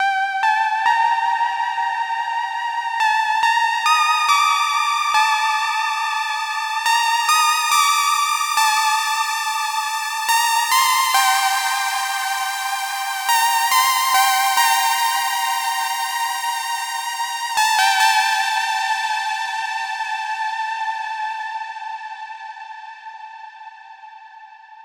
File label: pluck alone